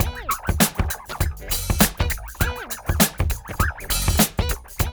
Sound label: mix, funky loops; East West; Funky Ass Loops